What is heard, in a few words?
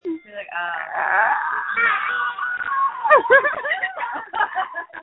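screams that feature laughter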